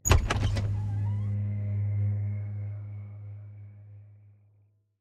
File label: Door; Silent Hill Downpour